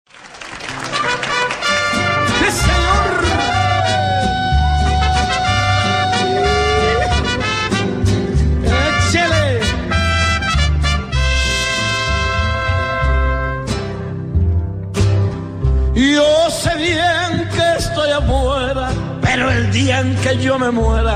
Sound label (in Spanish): mariachi